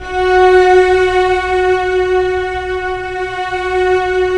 STR SYMST, Vcs1 Sym Slo; String Master Series

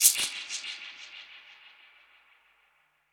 Perc Hit, dub percussion; musicradar

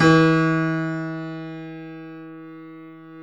C7 F, The Ultimate Piano Collection; East West

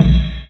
Loop, Drum Machines, Chamberlin Rhythmate; 300 Drum Machines; Processed

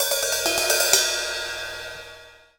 Ride Cymbal Roll; Essential Percussion

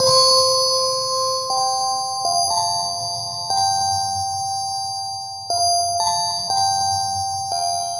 Witches Melody; Sonic Foundry (Sony Creative Software); Loop Noir

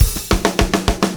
BACKBONE, Backbeat

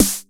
snare, techno 138 BPM; Best Service ProSamples vol.54; AKAI

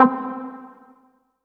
C4, synth line; 90 s Sample CDs; NiceBeats; SynthLine VOL-3

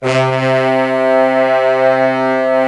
BRS LO BRS, Fat Pop Sect; Roland L-CDX-03 Disk 2; Fat Section

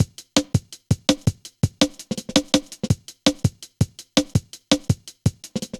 Skippy, Drum Loops, Jungle; Zero-G Jungle Warfare 1, 2 & 3

